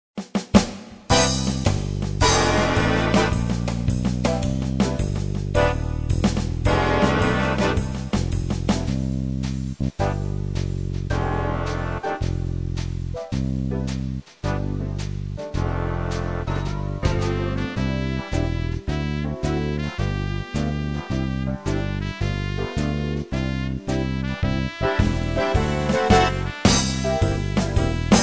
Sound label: jazz